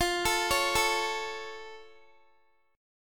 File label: F Augmented F down Guitar; F+ arpeggiated